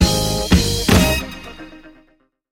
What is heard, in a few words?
70s stab